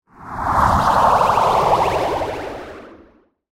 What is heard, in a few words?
Whoosh Sweeper, Dark, Accent, Transition; Production Element, Imaging Element